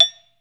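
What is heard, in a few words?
SARAN F3, Metalophones, Pitched Percussion; E-MU Producer Series Vol. 7 – Old World Instruments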